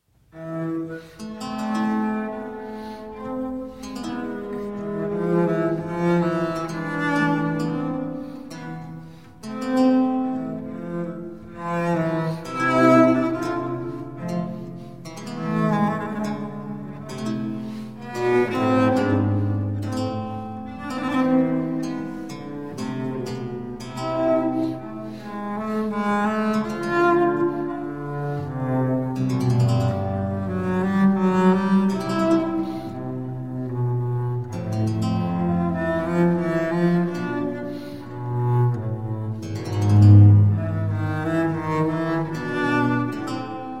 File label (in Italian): Sinfonia In Do Maggiore Larghetto, per Violoncello e Basso Continuo; Francesco Paolo Scipriani; Vito Paternoster; Sinfonie and Sonate del settecento italiano per Violoncello e Basso Continuo